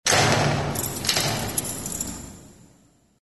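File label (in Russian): звуки дверей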